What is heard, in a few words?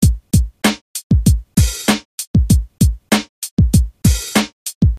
hip loop